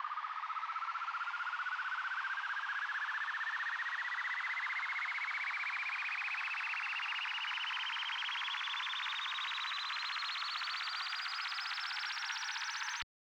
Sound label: FX Riser 129